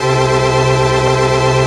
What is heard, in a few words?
ORGAN, 160 BPM, Drum & Bass; Ueberschall; Drum & Bass Flash Trackz